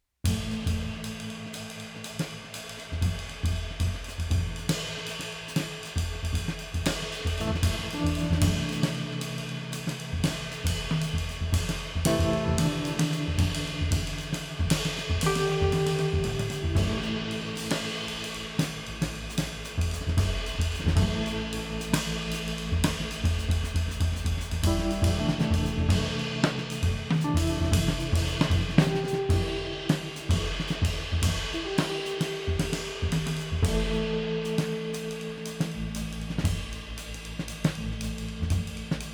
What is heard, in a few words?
Sneaking Suspicion Underscore, The Jazz Improvisations; Narrative Mind